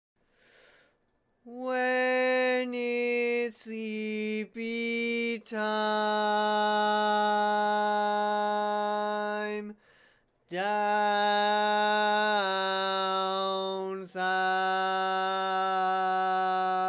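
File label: Baritone, barbershop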